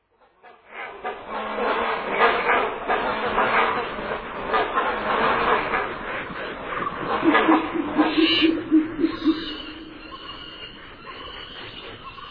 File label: hornbill; Hong hoang